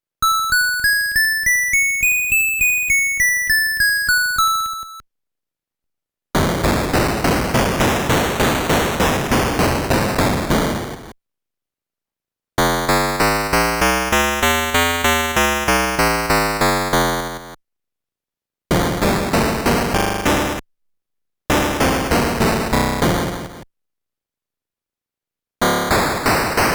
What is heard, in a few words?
Major Scale, pokey